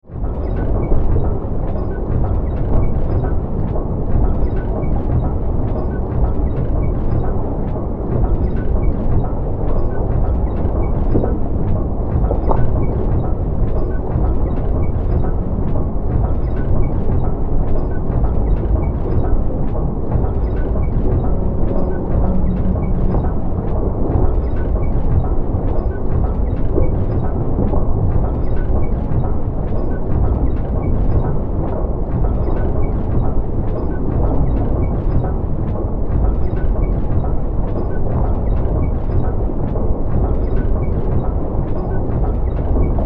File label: Submarine Vehicle, Submarine, Vehicle, Underwater, Movement; Steampunk, Scifi; 5.1; BlastWave